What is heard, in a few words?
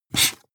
marker mark hard short